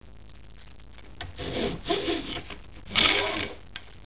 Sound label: pig